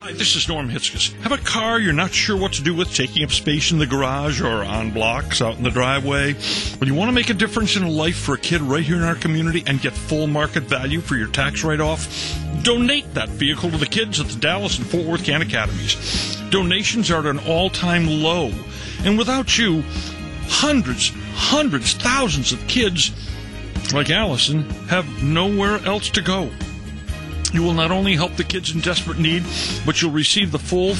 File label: sniff